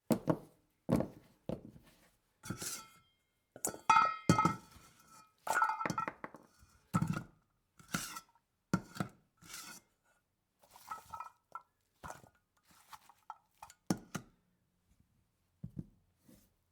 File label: vegetables mixing in metal bowl